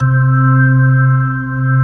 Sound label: B3 ORGAN; E-MU Formula 4000 Series Vol. 2